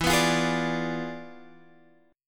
E 7th Flat 9th E down Guitar Drop D

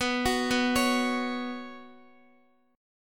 B Suspended 2nd B Guitar; Bsus2 arpeggiated

